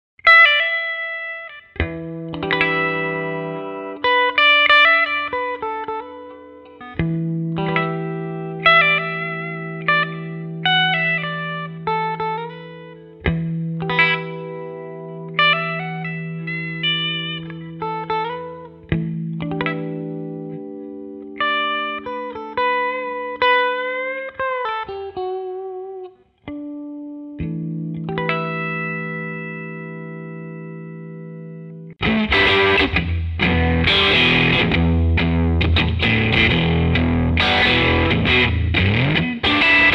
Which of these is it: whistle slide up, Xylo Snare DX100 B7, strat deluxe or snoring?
strat deluxe